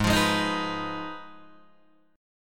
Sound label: Ab Minor 6th, Ab, Guitar, Open D